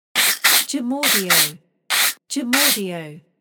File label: Shoe Brush